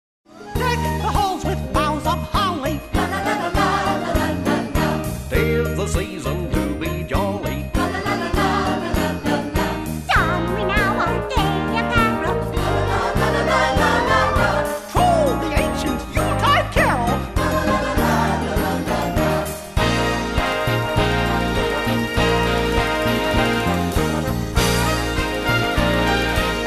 karaoke vocal; christmas favorites; disney

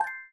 change clothing option; princess

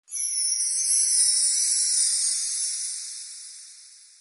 sparkle

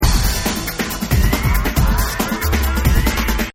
menu music

